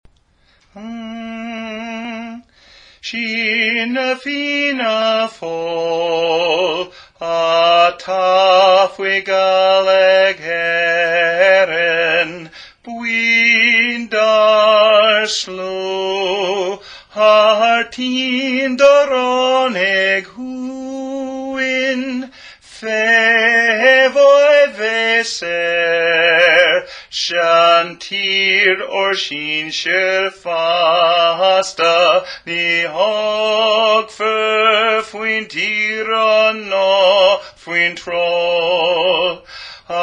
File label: Gaelic Lead Part; Soldier's Song